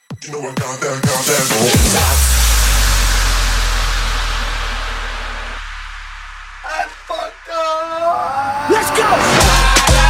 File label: Bass House Banger, 129